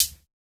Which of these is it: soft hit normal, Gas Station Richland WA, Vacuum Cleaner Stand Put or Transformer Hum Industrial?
soft hit normal